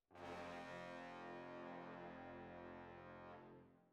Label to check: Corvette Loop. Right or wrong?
Wrong